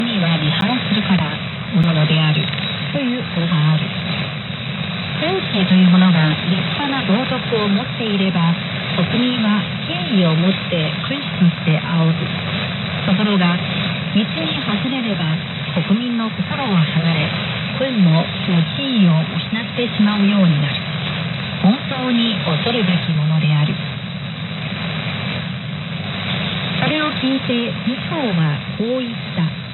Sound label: hf 7mhz